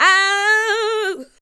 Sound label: ADLIBS